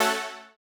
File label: Housey Stab A3; KG MegaSaur